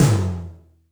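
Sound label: tom drum, left; Best Service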